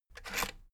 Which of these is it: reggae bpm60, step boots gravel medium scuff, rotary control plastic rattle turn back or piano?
rotary control plastic rattle turn back